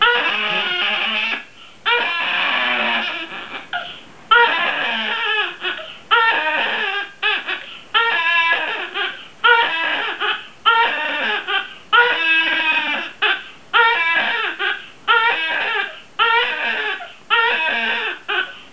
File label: vuvuzela